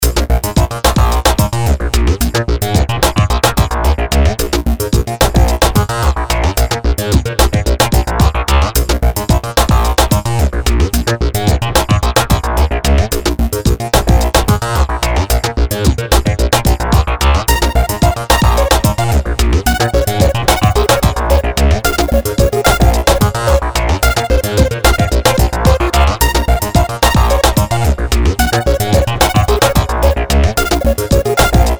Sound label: nu groove random